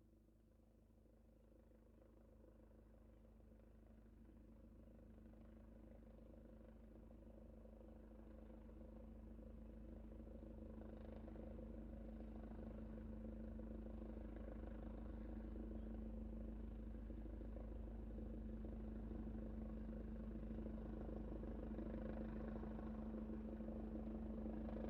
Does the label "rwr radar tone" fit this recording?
No